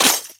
Glass break; 7rystan's 600+ FREE EDM SAMPLES & DRUMS; SFX; Foley

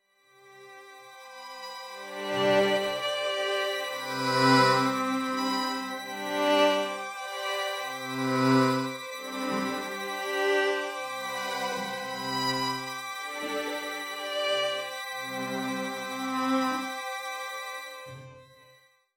pad violet G maj; synths pads; base pack

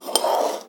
Glass on wood; Foley; SFX; 7rystan's 600+ FREE EDM SAMPLES & DRUMS

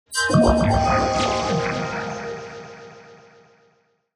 melodic fx digital doodad Ab Maj; base pack

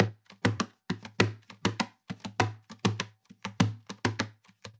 guitar percussion 100 bpm; percussion loops; bespoke - Floral v.1

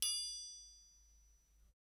percussion; bespoke - Floral v.1

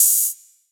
Hi-hat; Percussion; 7rystan's 600+ FREE EDM SAMPLES & DRUMS

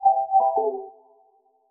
glitch C maj; fx; bespoke - Floral v.1

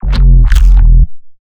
bass neuro E; Anthesis; base pack

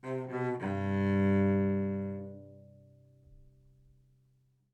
live string phrases, string phrase Cmaj 100bpm

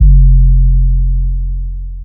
Trap bass, EDM

